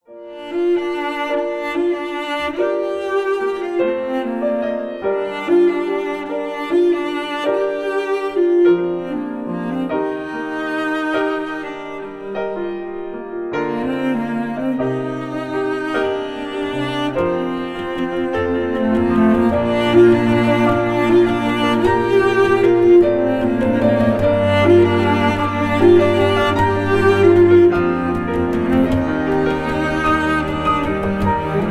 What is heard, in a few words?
piano cello cover; ringtone